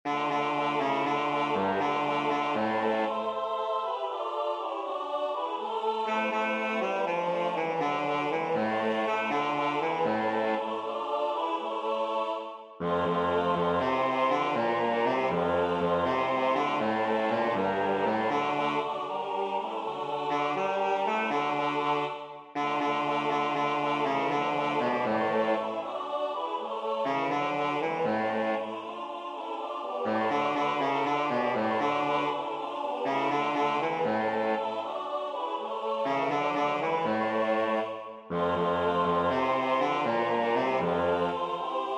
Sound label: bas